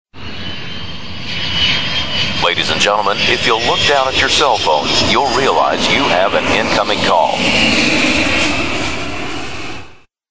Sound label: inflight call